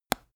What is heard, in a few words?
teeth clench; koerpergeraeusche; menschen; soundpool